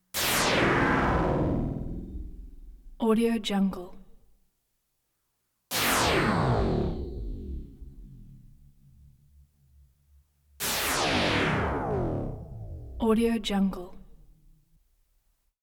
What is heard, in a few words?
synth zap tones descending throbby